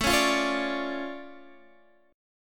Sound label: D Minor Major 7th, A, guitar